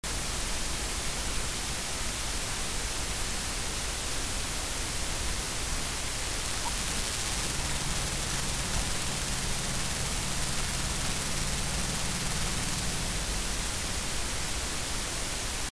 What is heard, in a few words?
motor noise